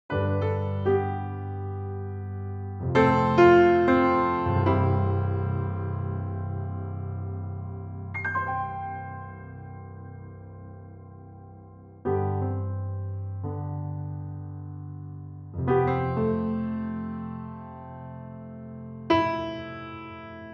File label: Steinway B, piano